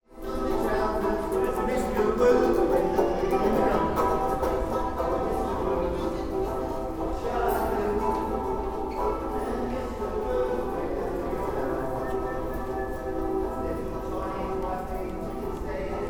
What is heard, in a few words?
southbank busker